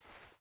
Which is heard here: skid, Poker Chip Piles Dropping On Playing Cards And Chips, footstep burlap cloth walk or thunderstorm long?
footstep burlap cloth walk